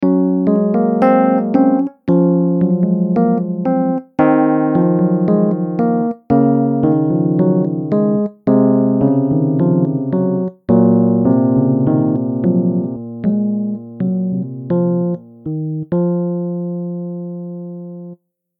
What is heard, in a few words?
fender suitcase